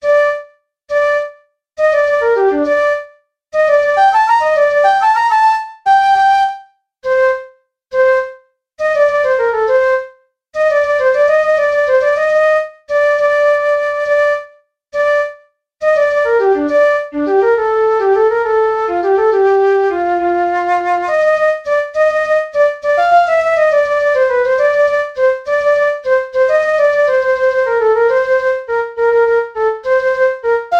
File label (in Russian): vals